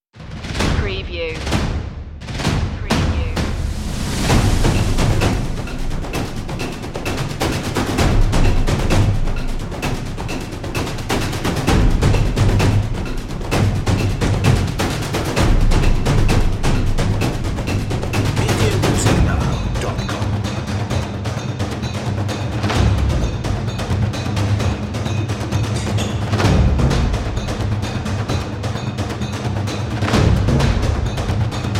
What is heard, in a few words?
trailer percussion